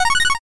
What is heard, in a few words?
arpeggio